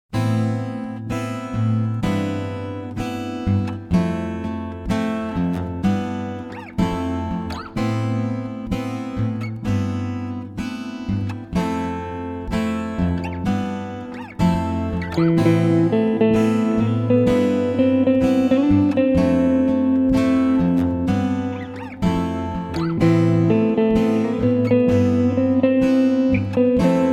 Guitar Version

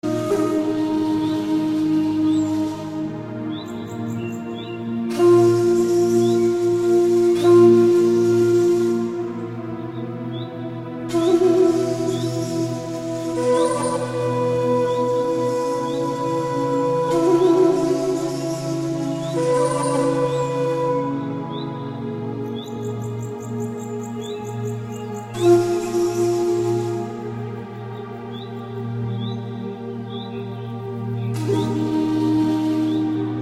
Bamboo Flute

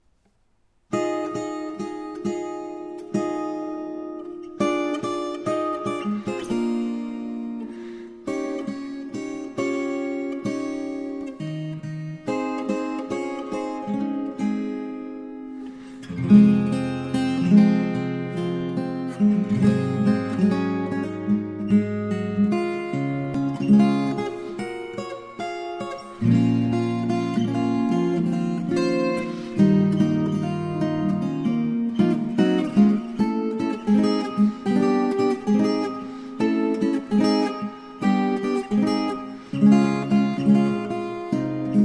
Instrumental Guitar

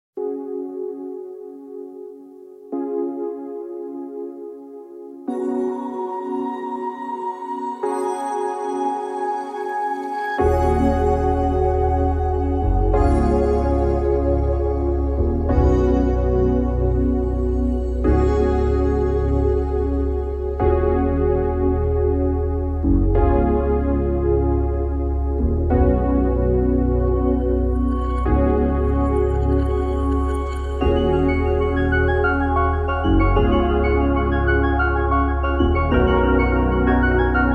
Instrumental

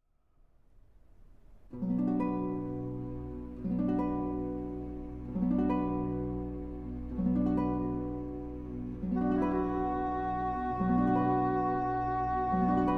Adagio, For Guitar and Orchestra; Concierto de Aranjuez

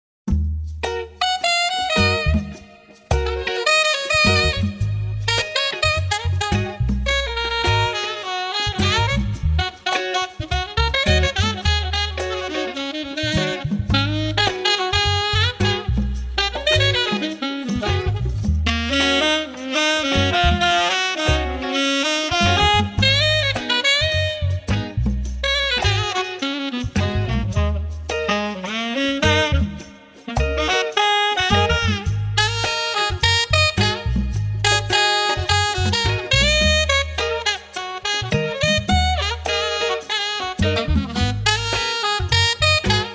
Holiday Reggae Jazz; Cafe Atmosphere

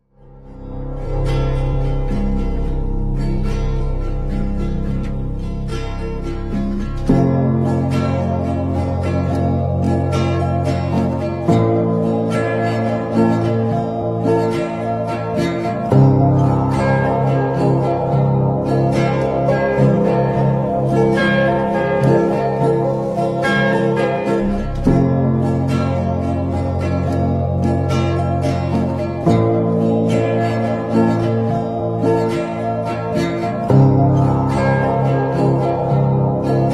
Instrumental

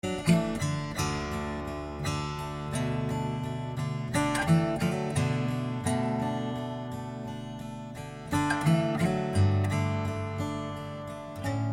Acoustic Instrumental

